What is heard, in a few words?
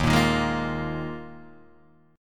D sharp Suspended 2nd Suspended 4th, Guitar, Half Step Down